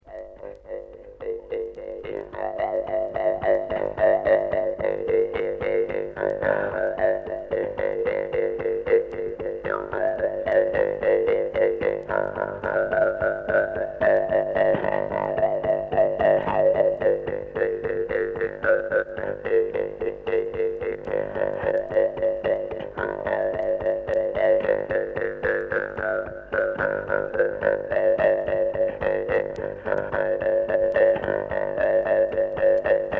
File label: Evenki Eveny Negidaltsy Jews harp; kunkakhi кункахи